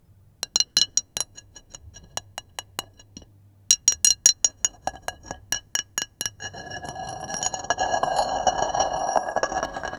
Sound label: stone block grinding